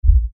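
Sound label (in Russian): звуки драки людей и удара кулаком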